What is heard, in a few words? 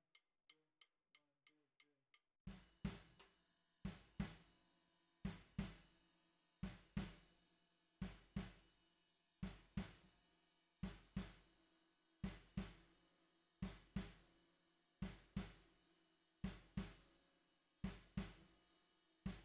SNR Bot